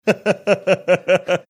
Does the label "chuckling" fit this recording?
Yes